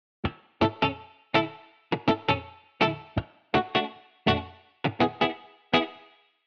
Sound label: guitar pattern 2 gt